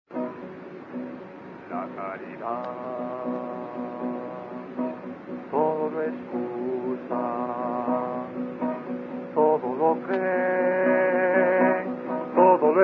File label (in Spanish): Himno a la caridad, Cantos camino neocatecumenal